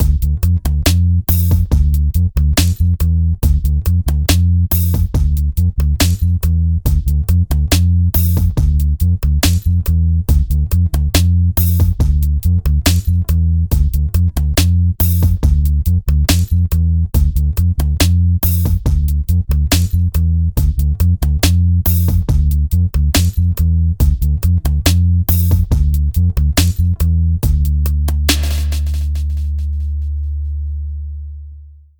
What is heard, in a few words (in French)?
Basse Batterie 70 Bpm